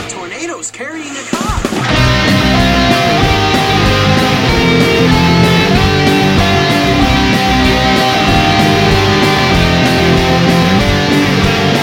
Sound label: Metal